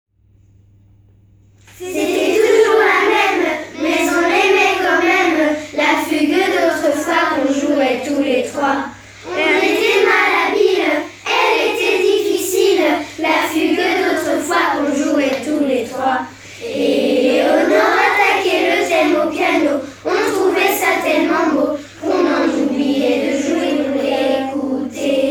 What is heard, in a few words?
Vocal